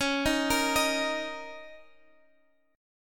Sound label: Bb Minor Double Flat 5th, Db